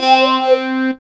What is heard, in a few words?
SYN, Roland; Sound & Vision - Gigapack II; Morpheus